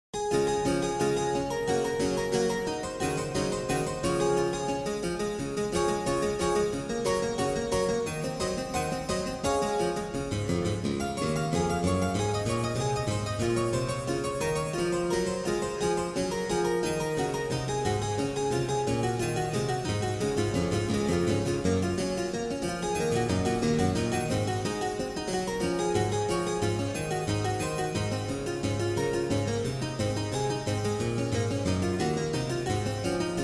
Grimaldi Harpsichord Bach BWV 927

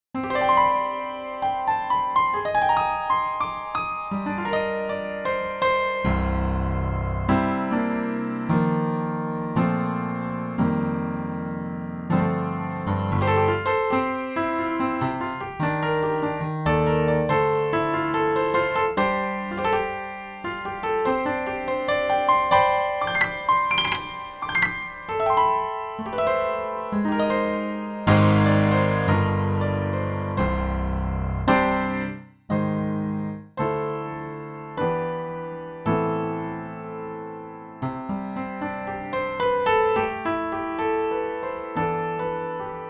YC5 bright

